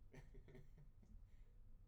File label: laughter; IEEE AASP